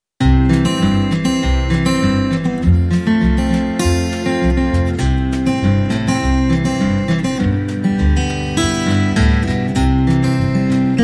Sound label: Country Barbecue